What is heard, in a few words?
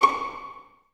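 violins C#6 pizzicato, String Ensembles; Miroslav Vitous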